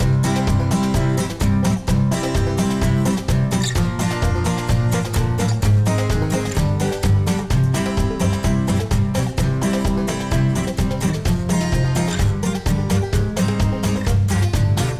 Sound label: Fishing Hunting; The Oregon Trail American Settler